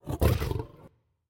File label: lion fx low; serengeti kings